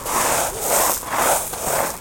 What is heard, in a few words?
Shaker; Cumbria Remixed Sample Pack